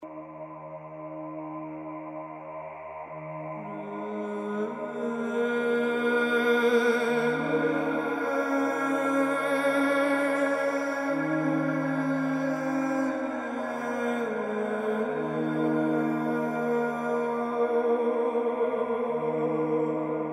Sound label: Vocalive